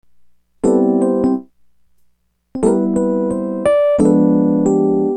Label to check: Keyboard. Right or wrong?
Right